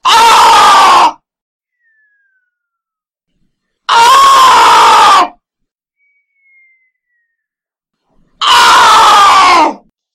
aaaaaaargh mommy

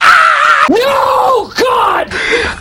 aw no breath

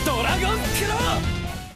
dragon claw

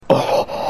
heavy breath

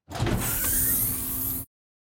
small door open

